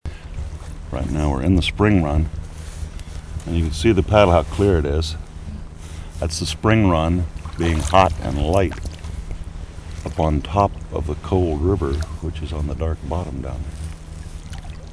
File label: manatee